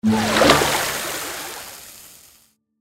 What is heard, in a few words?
expanding reel; Lucky's Fish and Chips